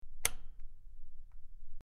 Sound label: Rotating Switch Clic